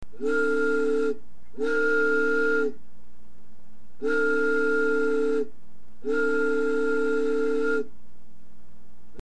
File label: large train